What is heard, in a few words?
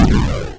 boost